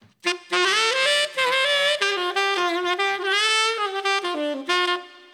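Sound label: Jump Up G Major 90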